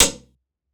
percussion oneshot latch; Pop; Drums; SD PASADENA Pop Sample Pack; Slate

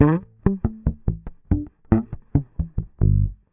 Bass one shot Bass Noise, Electric Bass; Slate Digital BASSHOLE Sample Pack

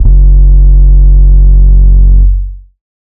808 Bass Slokick F#3; Slate 808 Deep Dive; SD Signature 808s

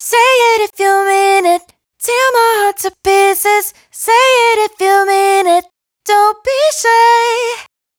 hook 120 G, Dry Vocals; Raw Vocals; Say It; Slate Digital Omnivox Vocal Sample Pack

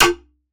snare oneshot, Pop Sample Pack; Slate; SD PASADENA Pop Sample Pack

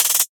hat, drum sample; Drum Sample Pack; One Shots; Hats; brap; Slate; SEISMIC

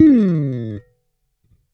Bass one shot Dive FX Sparkle, Electric Bass; Slate Digital BASSHOLE Sample Pack

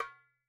darabuka Single hit; Raw Perc One Shots; Slate; SD SEISMIC Drum Sample Pack

